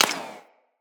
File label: Bullet Impact Ricochet